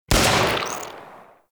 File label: Inf Uzi Shot; Infested; Weapons